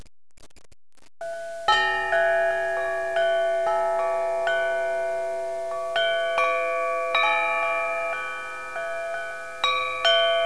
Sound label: Bar Harbor Bell